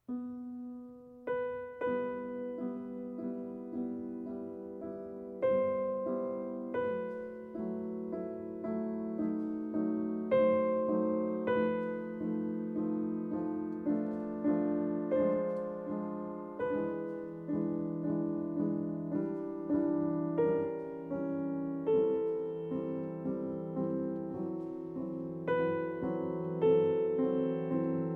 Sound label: Prelude No 4 in e-moll; F.Chopin